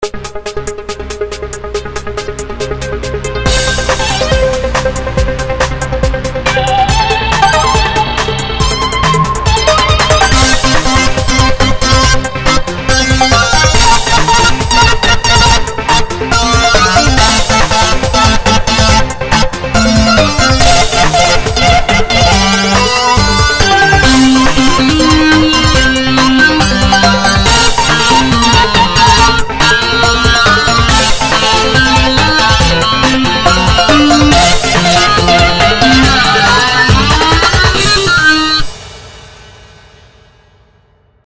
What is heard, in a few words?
boss guitar remix